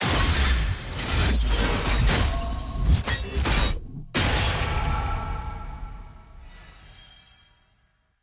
sfx championmastery level 8

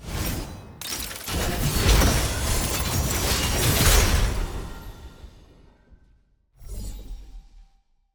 sfx tier crystals promotion to master; challenges

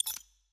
ui drag augment; rgm augments

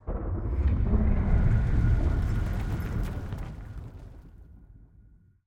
thunder; targon

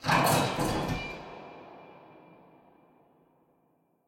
door jiggle

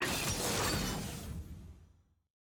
draft right pick single; champ select

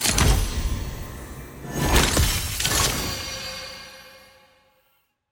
card appear; champ select; aram

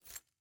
status window close; friend finder

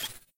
ui button dialogue click; tft

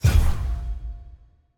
masteries tier 1 keystone pick; mastery panel

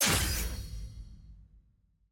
purchase click prestige

